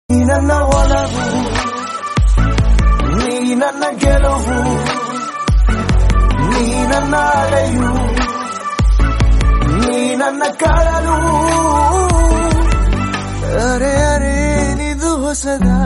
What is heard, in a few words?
kannada bgm